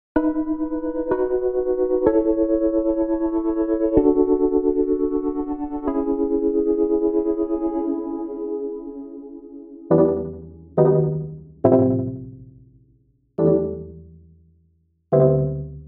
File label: Chords Neo Soul